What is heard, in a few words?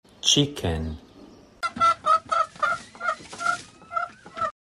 chicken